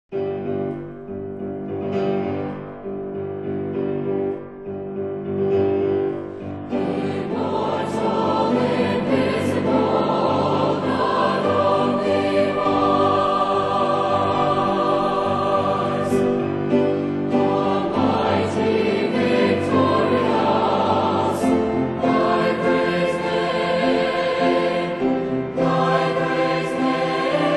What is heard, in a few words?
Concert Choir; Pillsbury Baptist Bible College